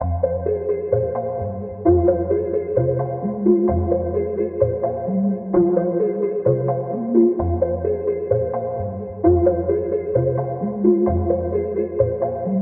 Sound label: main music